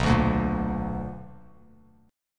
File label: B Minor Major 7th, down, Bass Standard; strummed; JGuitar